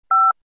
Telephone dial tone

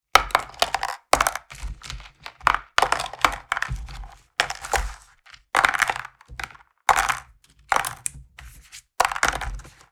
Board game box packing up pieces; leisure

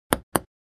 Ping pong ball bouncing

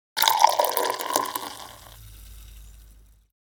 Champagne pouring into crystal glass fizz

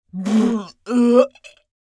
Mature woman retching

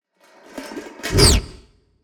Cinematic metal whoosh

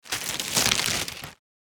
Thin shopping bag

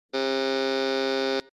Modern electric doorbell ringing

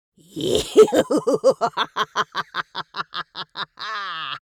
Witch fantasy vocalization laughing cackle evil

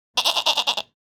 Goat bleating excited

